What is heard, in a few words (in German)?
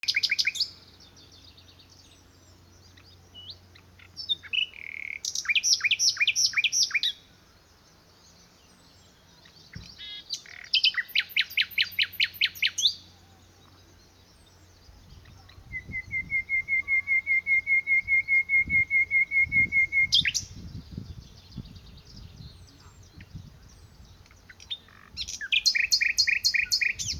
Nachtigall